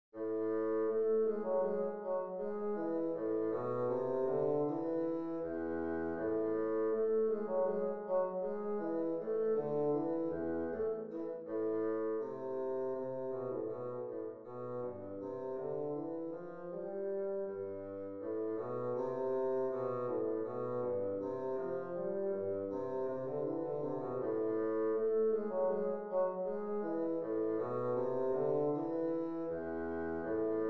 rigaudon mineur; telemann georg philipp

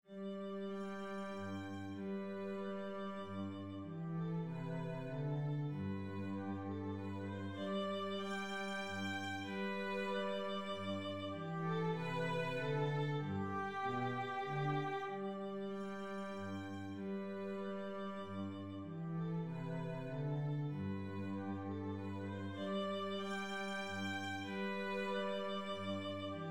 menuet sol

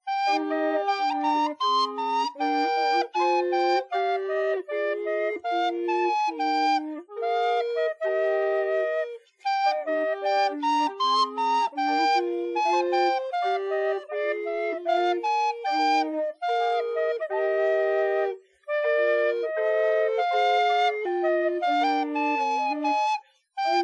trio pour fla bec

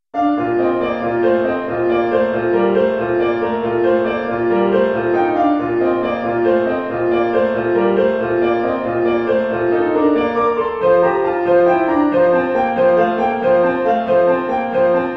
etude pour piano; Johann Baptist Cramer

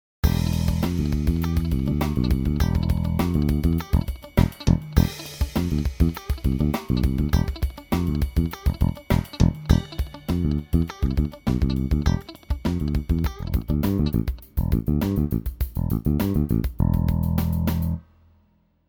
petit riff pour basse electrique

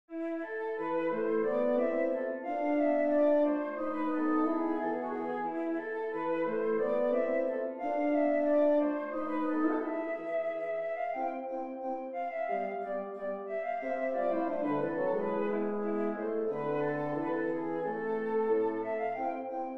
rigaudon mineur

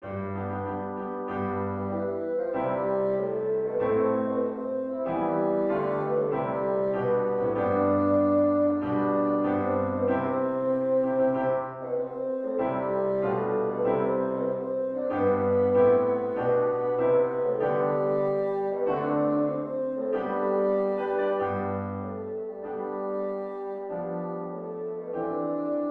hymne national de Belgique

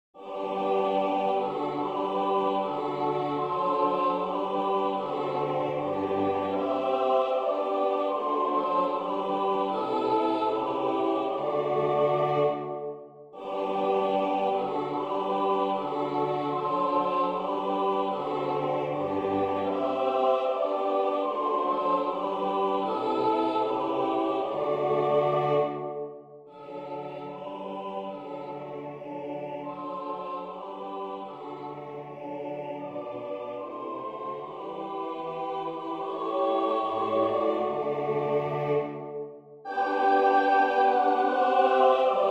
hymne national allemand; haydn joseph

